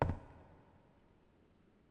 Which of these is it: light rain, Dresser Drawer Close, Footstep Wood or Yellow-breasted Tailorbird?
Footstep Wood